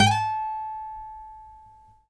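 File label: trill, Guitar; Quantum Leap; Guitar & Bass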